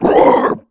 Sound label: gladiator DMG